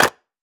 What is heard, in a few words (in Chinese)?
泥砖 踩踏声